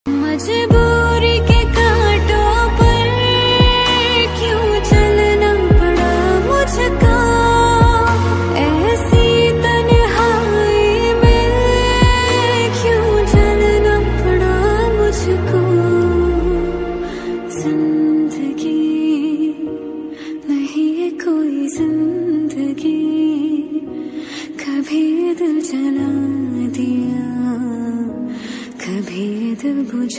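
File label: original soundtrack Ringtone Female version; Siyani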